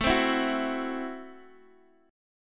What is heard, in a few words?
A Minor 6th A Guitar Open D